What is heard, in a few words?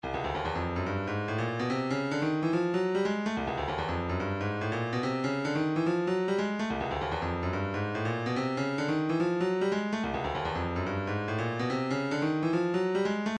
144 water bottle, midi